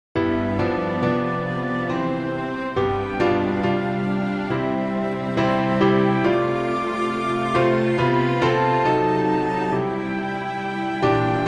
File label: music only; 2018 fsy musical program